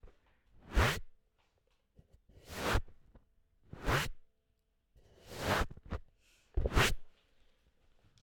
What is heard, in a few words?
Swoosh